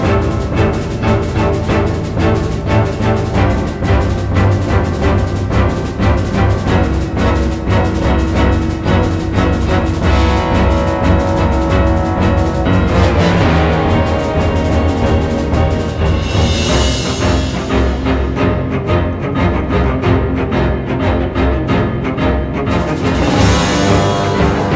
Warning Theme Loop

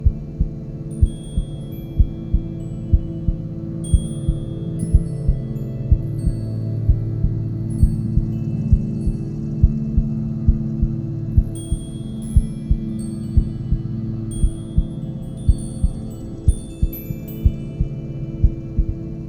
Detective mode Loop; jenny leclue detectiv

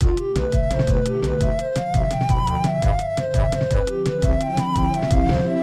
Clockwork Machines D Ethnova

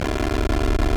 SOUND ENGINE RUN

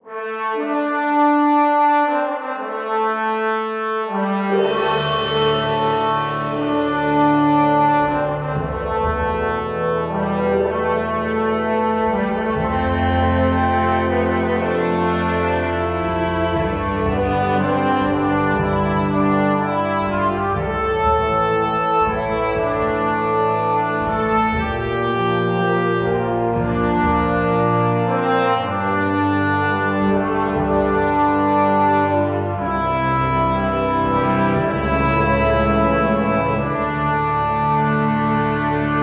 castle loop; Shuriken and Aliens

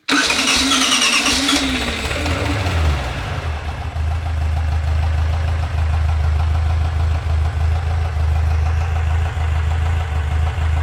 Engine; Cherricar; this is the zodiac speaking

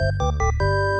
jingle; Namco Anthology 2; PS1

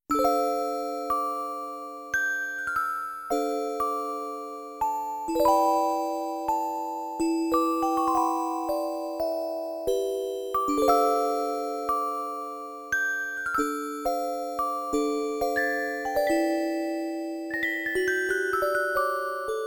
musicbox; My Merry May

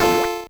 level squish F A; earthnight

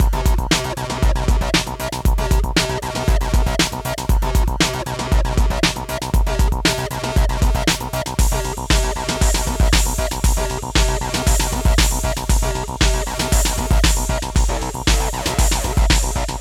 Cutoff loop

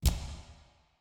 City SFX, Mech Fire Escape Sticks

